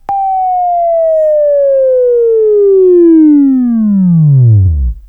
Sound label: WAV Right, Sweeping; Labview-Program; Sweeping Training program